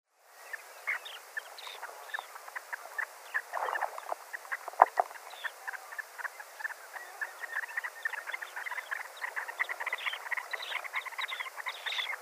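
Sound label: greater sand plover voice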